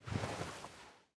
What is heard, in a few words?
armor; smite; baronsamedi skin7